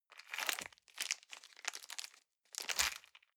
plastic bag small pocket stick in hard

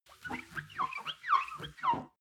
clean window paper towel close short

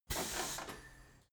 tire changing machine hydraulic separator short

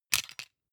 plastic frame small hit rattle

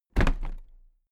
toolbox place upright regular

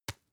tissue tap

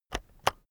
keyboard external enter press slow heavy

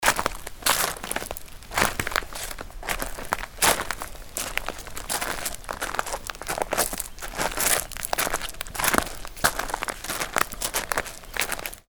steps on gravel

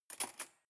cutlery cake fork drawer grab

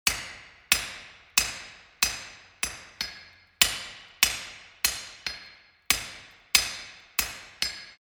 hammering

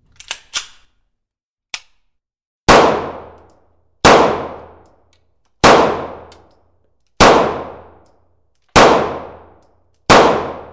handgun multiple shots

